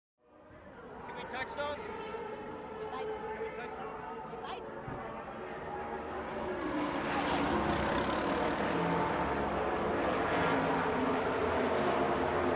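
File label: Car Race Paddock Ambience, sports car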